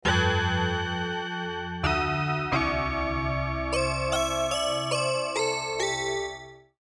sq80 digit

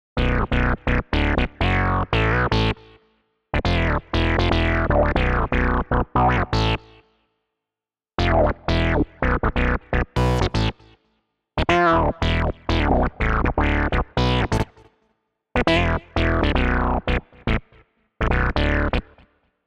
Bass

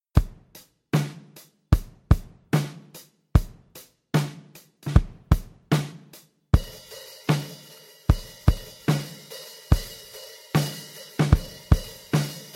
chrome kit dry

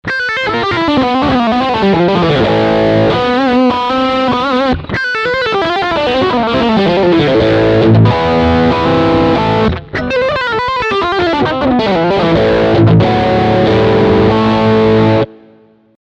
Riff Bridge Singlecoil

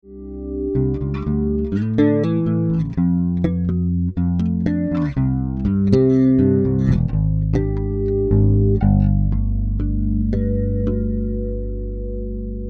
Chorus

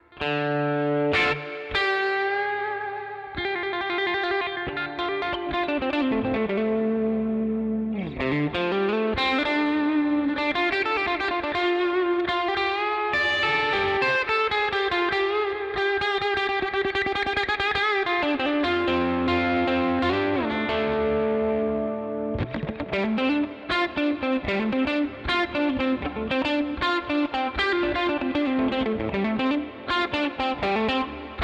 Neural DSP Quad Cortex Strat Ambience

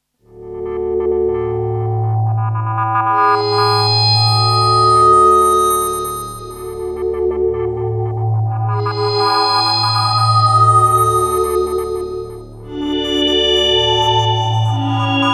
Digital Heavy Chorus; Boss CE-1

